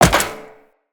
Tenno Wall Jump Metal Perforated, footsteps, wall run; Characters